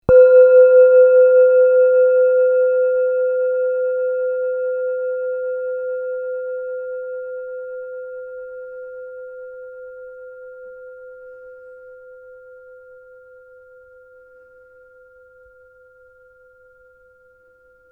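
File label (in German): klangschale sonne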